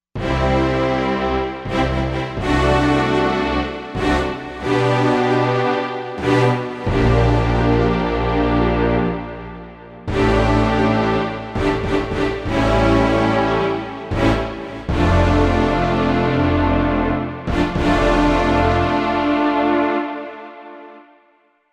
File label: Symphonika, Roland Fantom XR